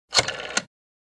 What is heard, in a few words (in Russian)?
phone